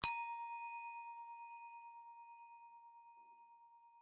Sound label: bell; rsi-break